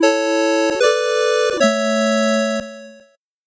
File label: 8bit jingle fast